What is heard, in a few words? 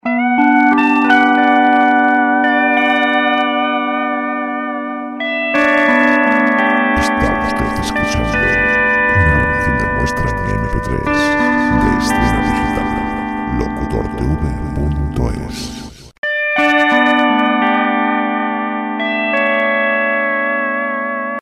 orient, chillout